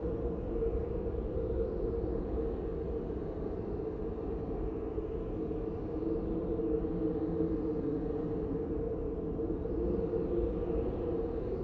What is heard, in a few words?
AMB nebu; Star Trek